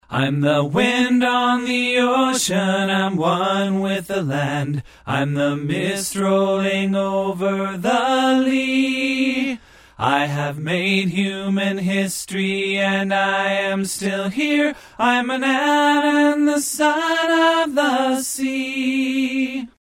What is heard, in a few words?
Tenor Harmony; First 2 notes are the same as the melody – “I’m the…”